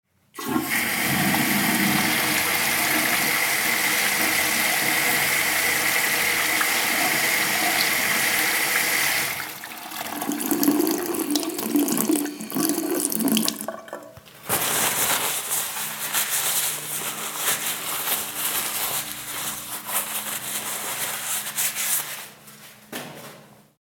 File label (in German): WC Spuelung Haende waschen Papiertuch